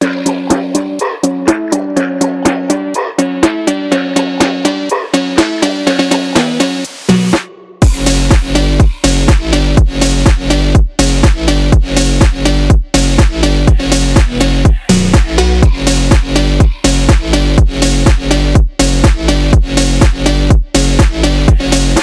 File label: phonk brazilian phonk music